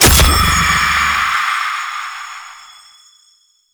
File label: Cursed Syndicate Medallion Pickup; Shadowgrapher